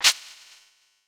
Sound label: Electric; Super Smash Bros; Pikachu